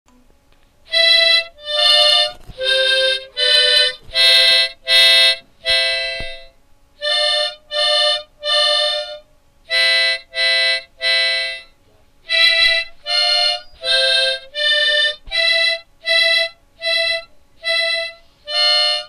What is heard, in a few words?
Harmonica